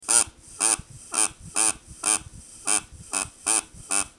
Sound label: duck